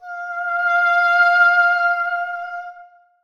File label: cor anglais f5; Sonatina Symphonic Orchestra